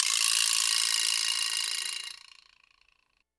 percussion

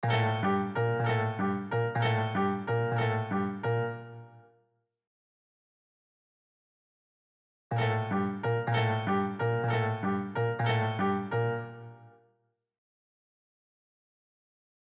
piano break

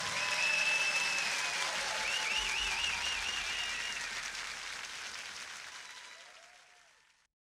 applauds

